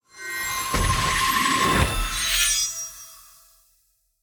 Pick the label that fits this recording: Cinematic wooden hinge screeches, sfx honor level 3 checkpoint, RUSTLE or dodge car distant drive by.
sfx honor level 3 checkpoint